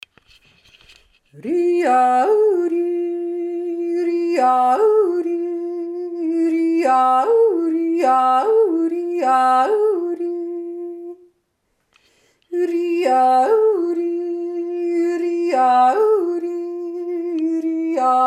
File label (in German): reiberer hauptstimme